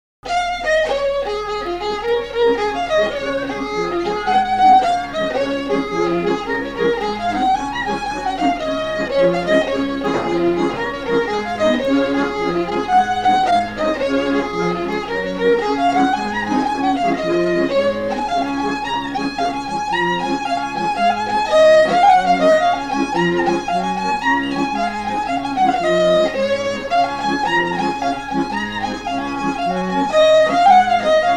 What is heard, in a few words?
fiddle; biddy from sligo; SeamusGrantFestival